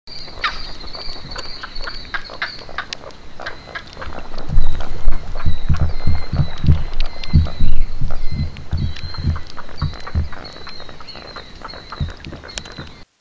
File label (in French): Héron vert Butorides virescens Son